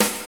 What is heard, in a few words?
SNR BASSY, Snares; Roland - Rhythm Section; Snares 6; Sn Modules 6